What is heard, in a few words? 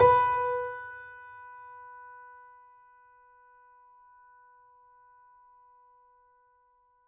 mini grand; bananenkeyboard